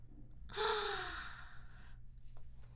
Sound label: Mini gasp